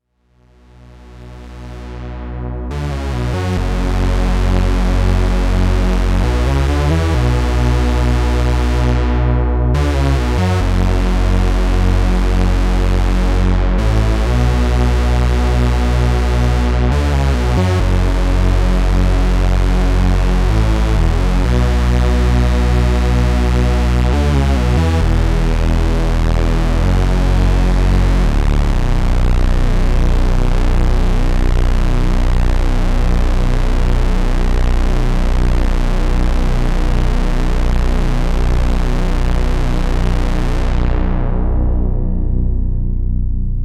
A110 4 VCOs Pulse

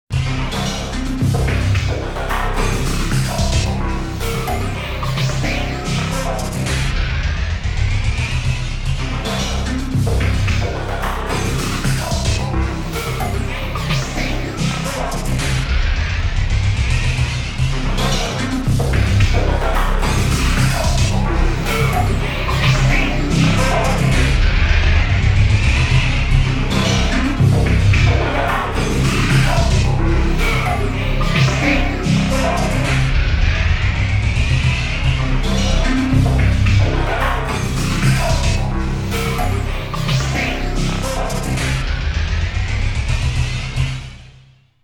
Sequential Prophet X; C Me Boom

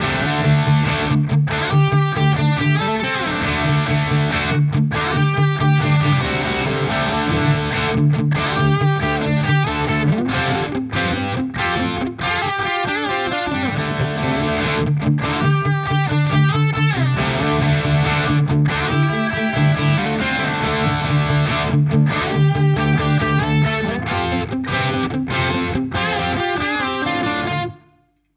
Epiphone Prophecy Les Paul Zerre Bridge Modern

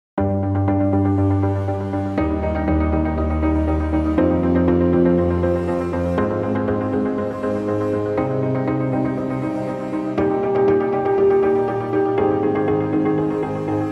Grand Echoes; Heavyocity Ascend

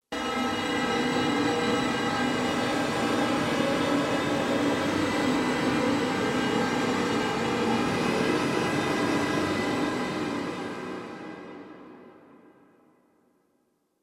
Ambiance FX Hard Metallic Edge; Elysion 2

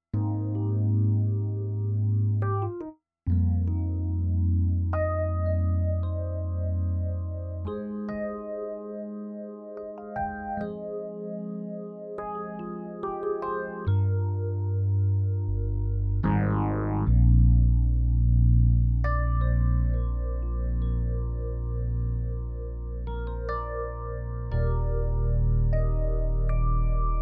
Numa Concert E-Piano

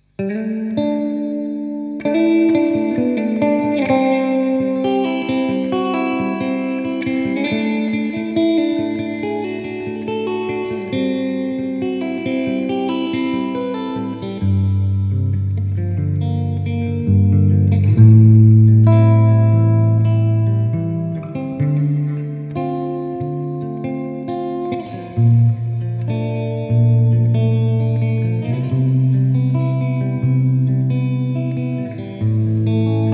clean spring

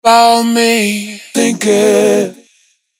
Vocals Crystal Pop

